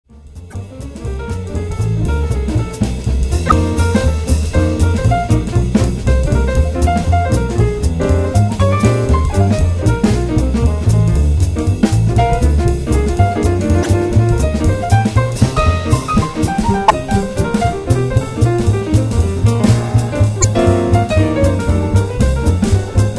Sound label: blues